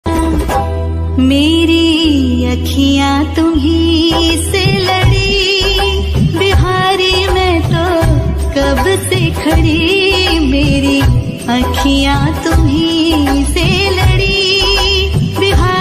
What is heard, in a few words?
bhakti songs Hindi ringtone